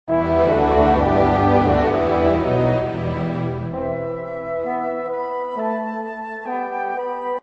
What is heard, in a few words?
Transition song; the black knight get medieval online 2005